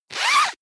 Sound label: zipper pullover jacket open fast; objekte; reissverschluss